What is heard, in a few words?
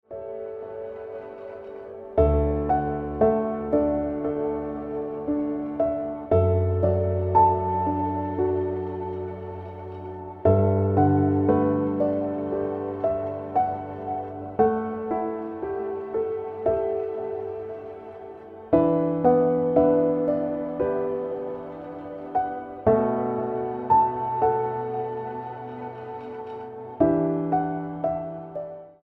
Ringtone Piano Music